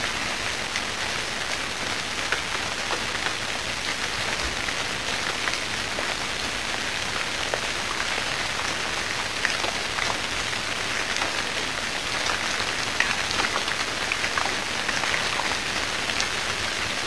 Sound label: rain